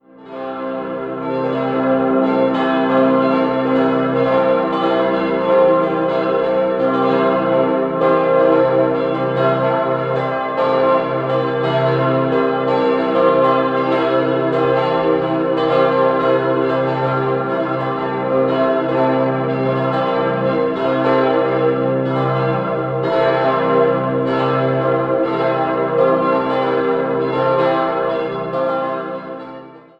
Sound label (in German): glocken; Wegscheid